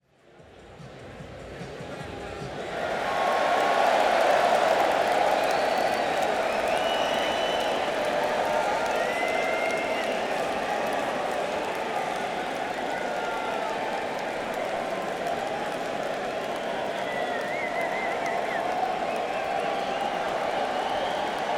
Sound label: Crowd; Manchester, NH - SNHU Arena